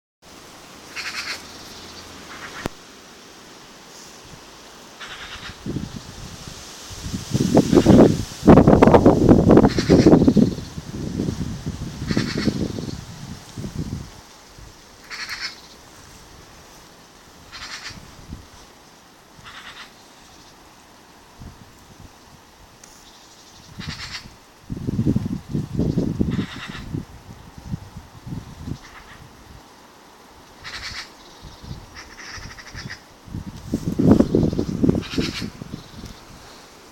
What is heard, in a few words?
dawn chorus